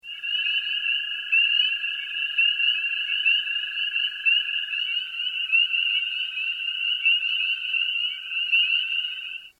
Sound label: Spring Peepers